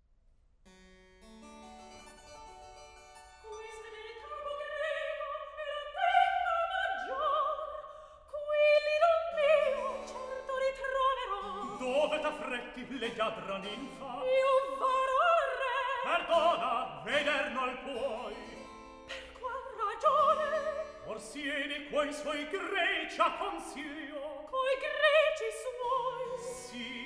Recitativo; Questa del campo greco; Mozart; Il Re Pastore

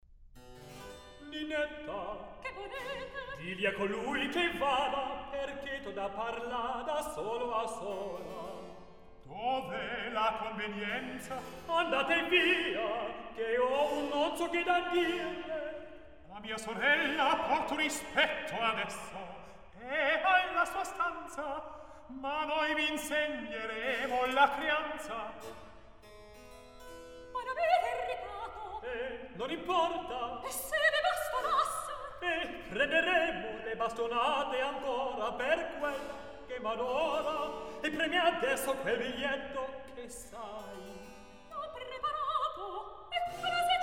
Recitativo; Ninetta Che volete; Mozart; La Finta Semplice

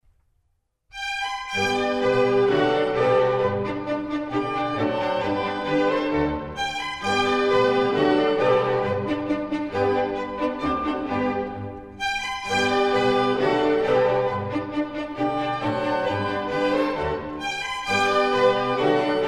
Minuets, G major; Mozart; Dances and Marches

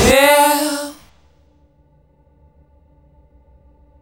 (Yeah)

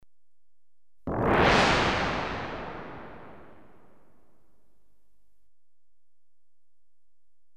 Single crash, Electronically Generated Sounds; Sound Effects Library

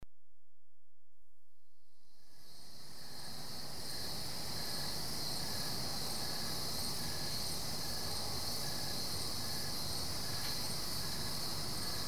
Goldens Bridge, NY State - crickets at night; America; The BBC Sound Effects Library

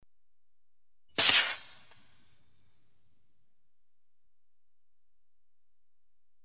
Lifeboat, Single maroon fired; The BBC Sound Effects Library

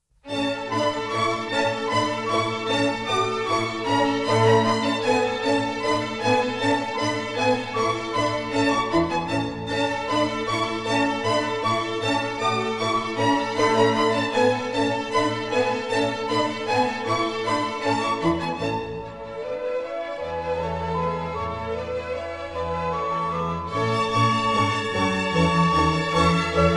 German Dances, A major; Mozart; Dances and Marches

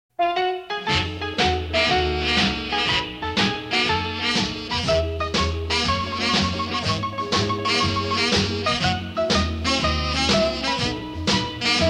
(Instrumental)

